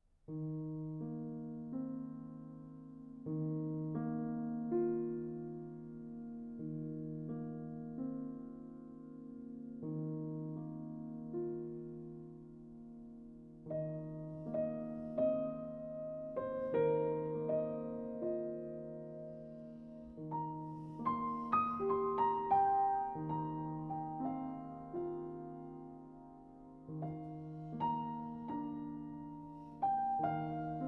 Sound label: Traditional Lullaby Northeastern Cradle Song, Piano